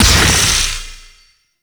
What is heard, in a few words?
Archer Disengage Ability Explode; Warframe; Duviri; Dax Archer; Abilities